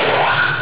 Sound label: shutdown; ParadroidII